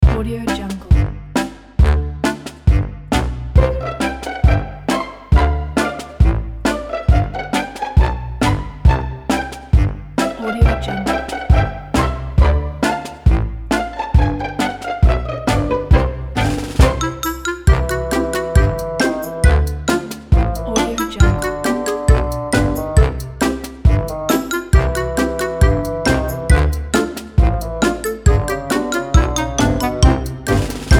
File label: cute and amusing